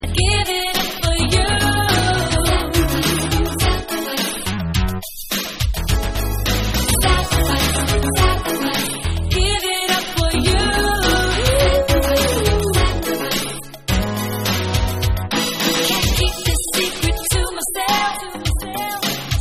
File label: Vocal Long Version